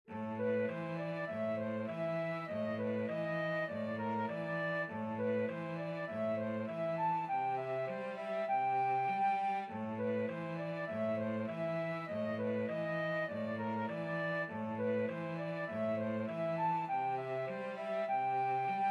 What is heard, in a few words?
flute cello duet